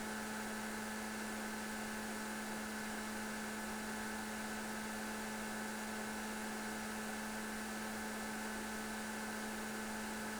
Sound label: 13000rpm, BDR-S11J-BK